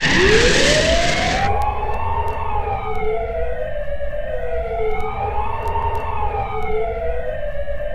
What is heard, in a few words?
SIRENE IN, TOWN FX; USB Soundscan vol.02; Underground Hip Hop; AKAI